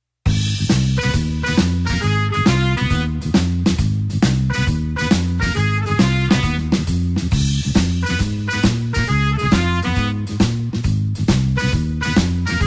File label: blues horns shuffle Ab